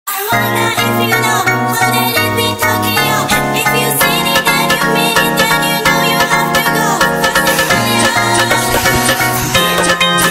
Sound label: brazilian funk remix; phonk